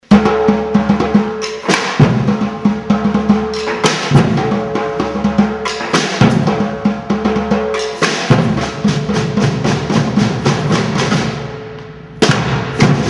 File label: Funk